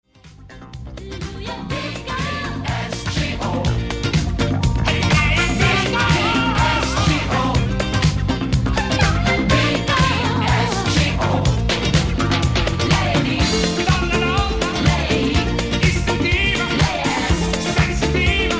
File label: rock